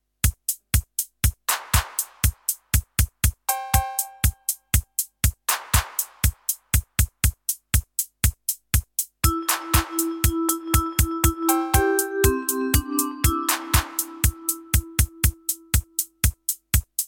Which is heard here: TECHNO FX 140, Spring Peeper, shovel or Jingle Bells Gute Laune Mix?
Jingle Bells Gute Laune Mix